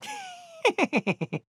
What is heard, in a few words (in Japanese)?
攻撃